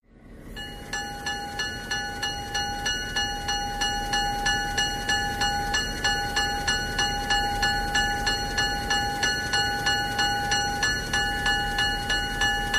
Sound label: Train Idle Engine, diesel